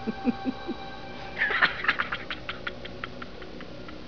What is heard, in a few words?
laugh